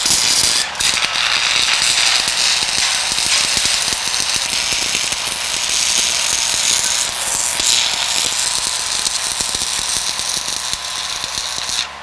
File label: welder